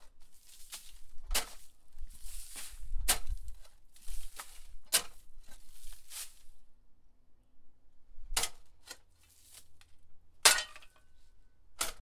Digging Into Dirt

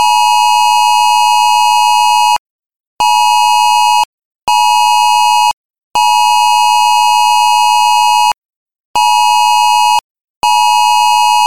Iphone Emergency Alert Attention Sound

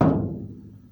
Metal Thud

